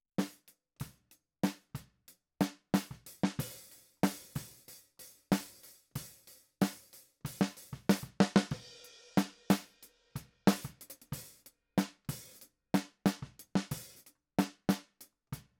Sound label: Snare